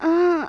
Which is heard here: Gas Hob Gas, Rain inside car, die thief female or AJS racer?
die thief female